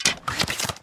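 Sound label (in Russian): скрежет по металлическому оружию ножом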